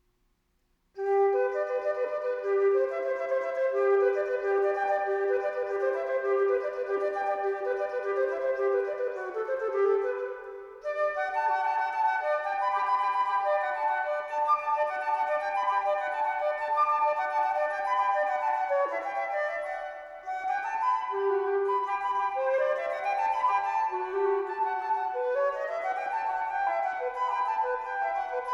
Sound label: Crystal Flute; Teleman Fantasia 11; Ringtone